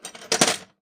tools, wood metal; wood metal SFX

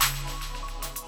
Resonant Clap; Drums; BB 2HTC Samples Vol 4 Addendum

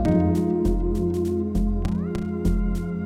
Pad With Pitch Bend; BB 2HTC Samples Vol 4 Addendum; Weird

